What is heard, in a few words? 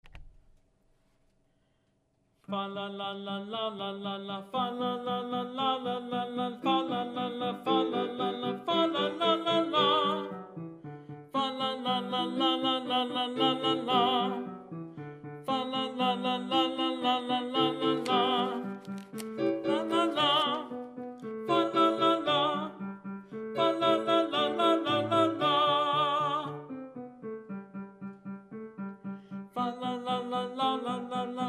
Fa La La Tenor; Choral Resources